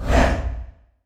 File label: heroes teleport In; therien